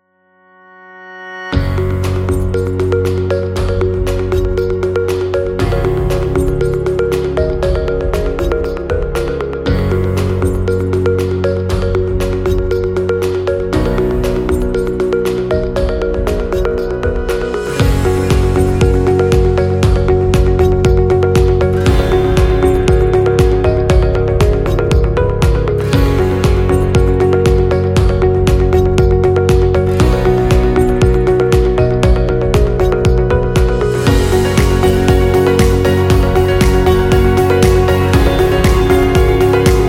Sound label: Educational Promo